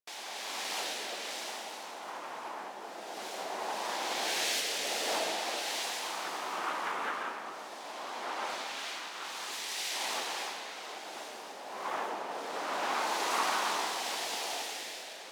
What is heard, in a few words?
Exterior Wind Bed Loop; Warframe; Sentient Devourer